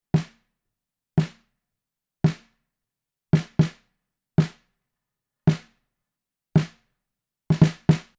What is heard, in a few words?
Sennheiser MKH40 Snare